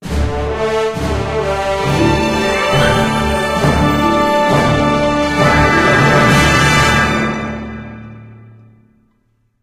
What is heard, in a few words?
Victory - Fanfare; undefeated windows gamerip 2015